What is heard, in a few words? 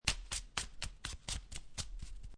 Playing Cards Shuffling Cards In Hand